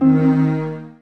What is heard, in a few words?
Musician Rebloom Bass